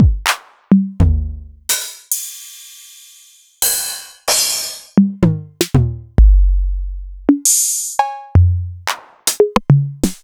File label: Novation Drumstation